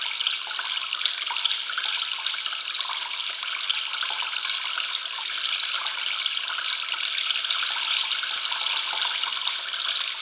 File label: amb water drip; ambient; atmosphere; Counter Strike Model Pack; CS:GO